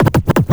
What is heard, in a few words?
SCRATCH